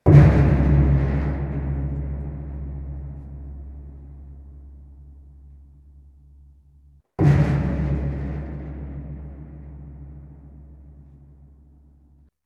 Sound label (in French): bang cuve, steinbruch